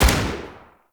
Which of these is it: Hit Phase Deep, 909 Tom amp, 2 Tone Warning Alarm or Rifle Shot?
Rifle Shot